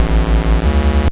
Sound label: door